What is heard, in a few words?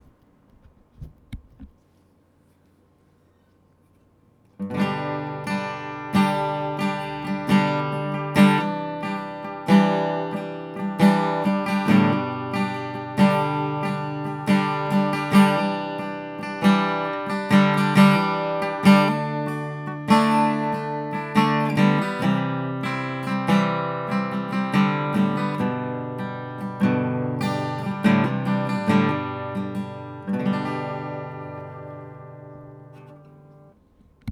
guitar; H2n